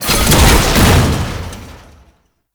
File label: Tank Ram Ability; Warframe; Lasrian Tank; Abilities